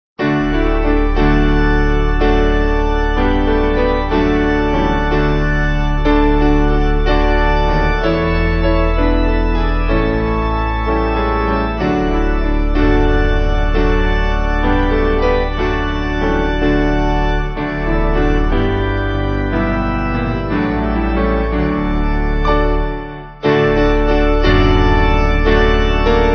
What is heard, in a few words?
Piano Organ